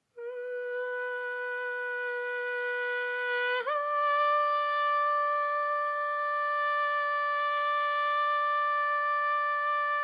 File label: Beatitudes Descant